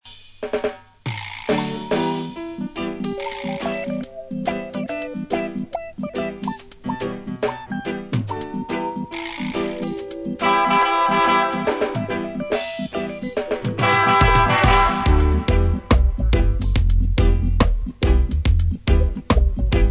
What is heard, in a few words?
roots of roots, horns